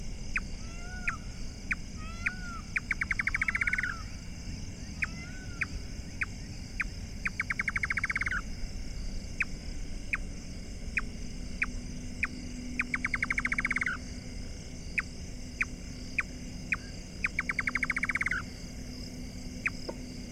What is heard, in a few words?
Indian Nightjar Caprimulgus asiaticus